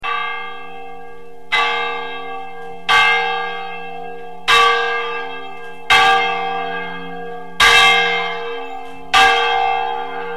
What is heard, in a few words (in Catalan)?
campana